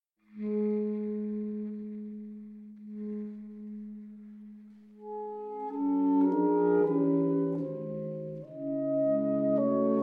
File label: for Saxophone Quartet